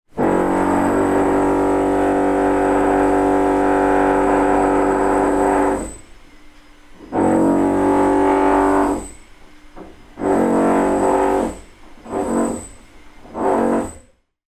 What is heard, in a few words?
industrial drilling wall floor below